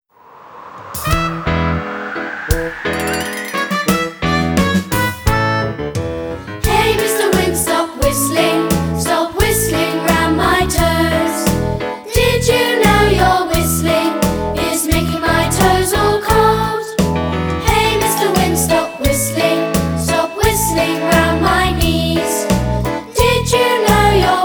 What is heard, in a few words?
Kids Singing; Mister Wind